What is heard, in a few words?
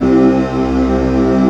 PAD -L, 168 BPM Drum & Bass; Ueberschall; Drum & Bass Resonance